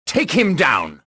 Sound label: anger